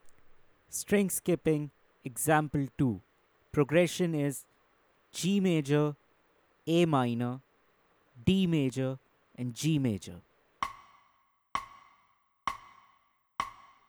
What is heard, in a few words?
String Skipping Playthrough Slow Speed, lead guitar; string skipping arpeggios; The Art of Lead Guitar